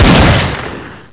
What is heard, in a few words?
deagle shoot, weapons